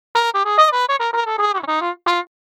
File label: cup mute, Trumpet; samplemodeling